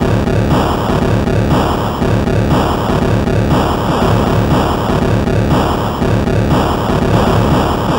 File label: Atari 2600 Noise Drums